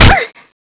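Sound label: bullet hit flesh female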